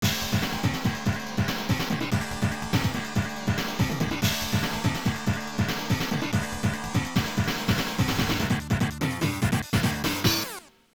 Continue GAME OVER

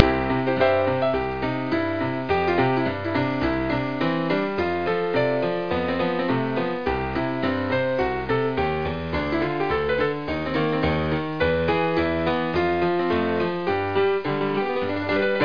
Saloon Ragtime; freddi fish 4 the case of the hogfish rustlers of briny gulch

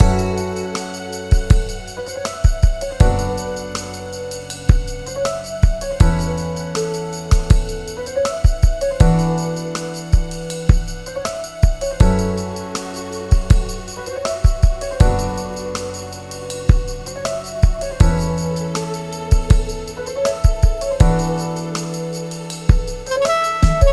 Metal Butterfly INST; anonymous agony windows gamerip 2019

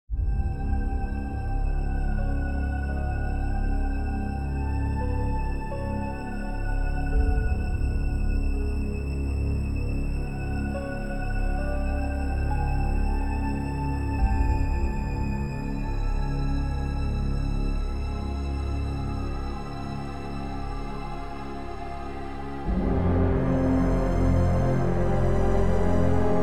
Game Over Theme; Haegemonia Legions of Iron Original Soundtrack

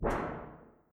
Vent Move; Among Us

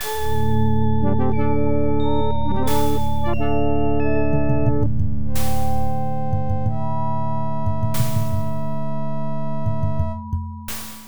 Pick Up Stone Head; Indiana Jones and the Fate of Atlantis; IBM PC AT

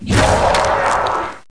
die zombdroid Soldier

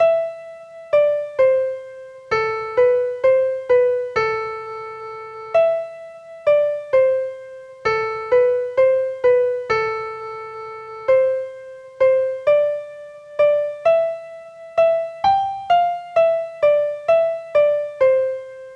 Piano Game; Piano Game Kings; christmas carol; nds

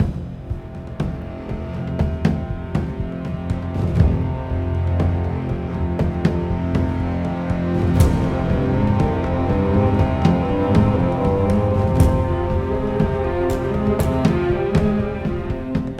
String; Assassin's Creed Unity Dead Kings

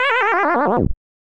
Mixed Match Game Over; tetris dr. mario super nintendo gamerip

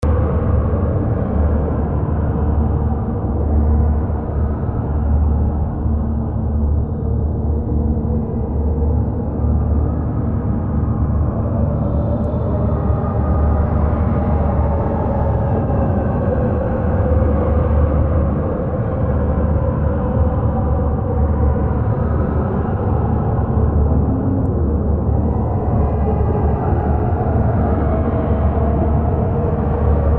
Ambience; SCP-1499

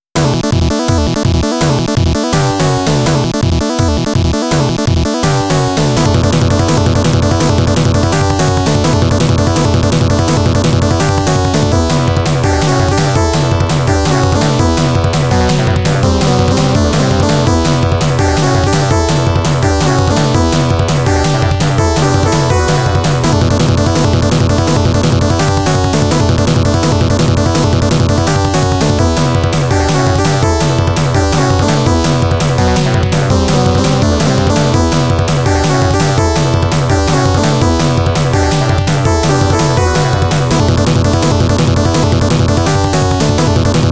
Heavy Wind; snk arcade sound digital collection vol. 01

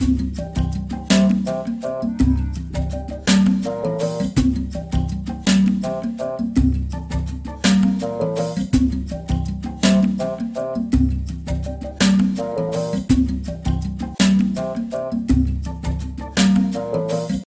Jungle Level

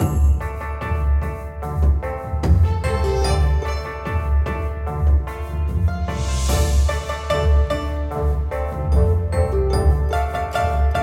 Scene Music; Age of Wushu; Windows

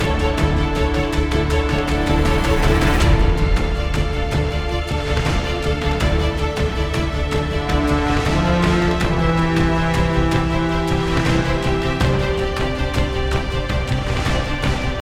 Mood High

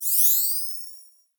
SFX PowerUp; Zatacka 360; xbox 360

